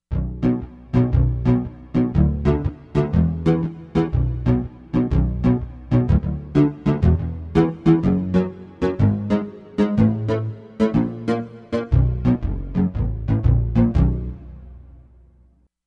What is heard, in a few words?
Rubass, Korg MSC-13S Ethnic 2